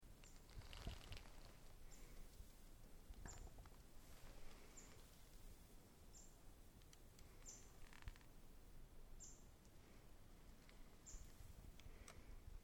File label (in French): ecureuil